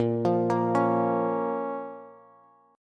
A sharp Minor A sharp down Bass; arpeggiated; JGuitar

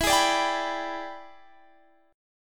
E 7th Sharp 9th Guitar Standard, chord; E7#9 strummed; JGuitar

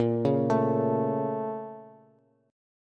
Bb Minor Major 7th, Bass; BbmM7; arpeggiated; x,13,11,14; JGuitar

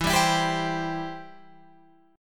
E Minor Sharp 5th, Guitar, Open F; Em#5 strummed; JGuitar

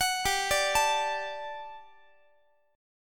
G Major 7th Suspended 2nd G down Guitar; GM7sus2; arpeggiated

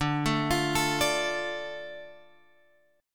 D Minor D Guitar Drop D

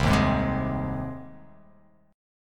C Minor Sharp 5th, chord; strummed; JGuitar

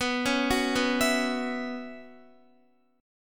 B Suspended 2nd Suspended 4th, Guitar; Bsus2sus4; arpeggiated; x,14,11,11,11,11

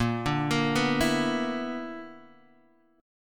B-Minor Major 7th Double Flat 5th

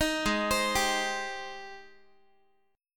Gsharp Major 7th, Guitar; arpeggiated; 18,16,15,17,x,x; 4A,4E,5A,5D,5G#,6C#; G#M7

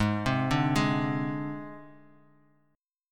Ab Major Flat 5th Ab Bass Standard; AbMb5 arpeggiated; JGuitar

